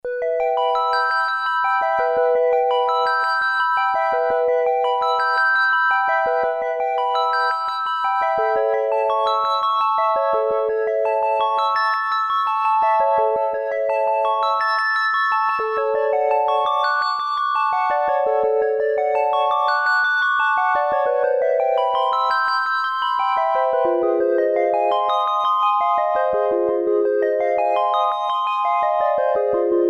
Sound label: Arpeggiator, Korg Poly-61M